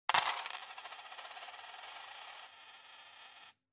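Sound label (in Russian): монетку бросили она крутится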